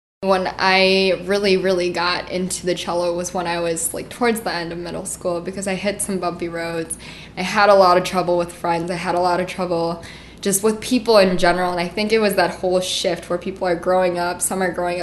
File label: Cello; On Playing Cello